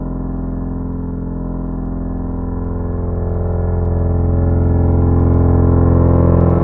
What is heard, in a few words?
Keys Tiny Piano; Synthetic's Serum Collection; ESW X Keys